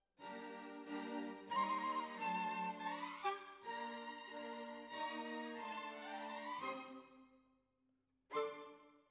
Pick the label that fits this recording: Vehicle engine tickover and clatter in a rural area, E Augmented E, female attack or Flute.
Flute